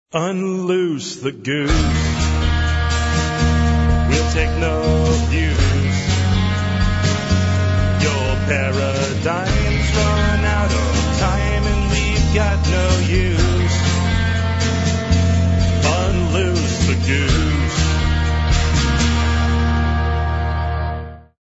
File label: jingle